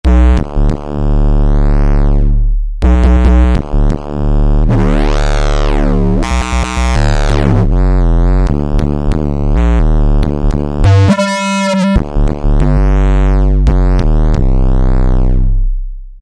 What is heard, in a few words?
Valve Kick Bass, Korg microKORG; Factory Voices